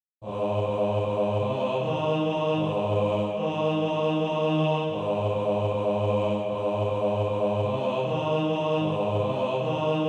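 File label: bass, SATB Practise Music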